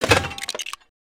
Metal Object; destructibles

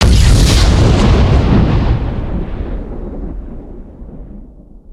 ship explosion; destructibles

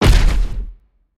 Spider Boss Jump Impact; creatures mechanical

